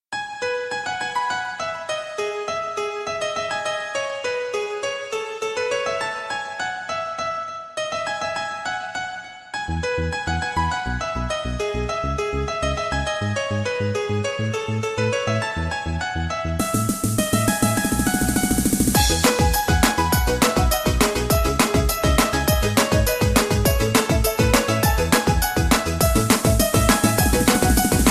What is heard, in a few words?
Awesome Cute Tone